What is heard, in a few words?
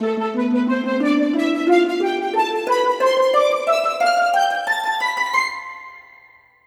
Musical Reel Spin; Fluffy Favourites; 15 years of Fluffy